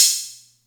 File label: Atlantex Music Percussion Computer Cymbal Short